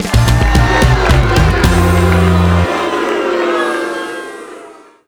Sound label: Worst Jingle; xtreme sports pepsi max extreme sports pc gamerip